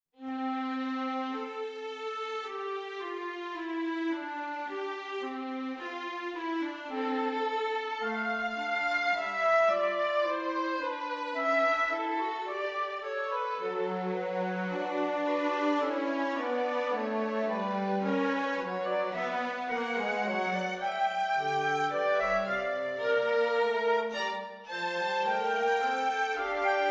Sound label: strings